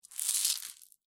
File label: Marbles Or Pebbles Rattle; Several marbles or small pebbles being dropped.; Miscellaneous Toy Sound Effects